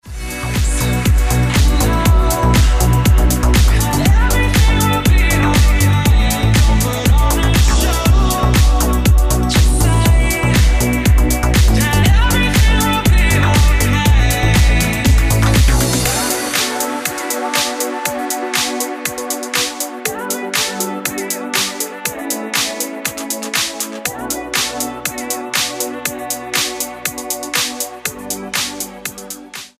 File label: Ringtone